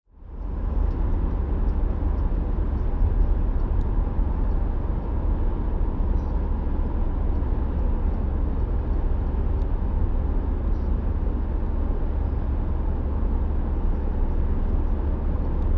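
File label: shum mashyny po trasse, noise of car on highway; Звуки движения в автомобиле по трассе, шум во время езды с разной скоростью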